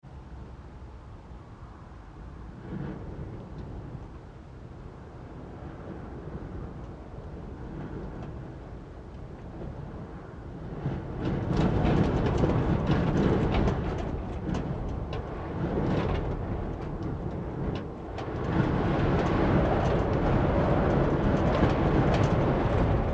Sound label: Wind Attic Space Gusts Med; Interior Attic Space; Rattles; Weather